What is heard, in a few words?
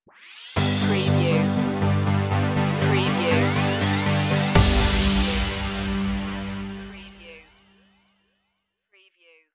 stinger; arpeggiator